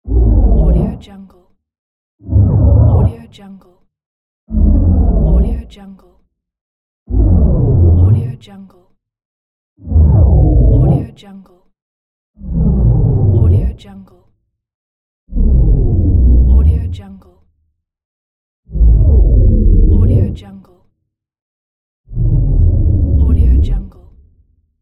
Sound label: short slow motion; gamechestaudio